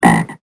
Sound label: frog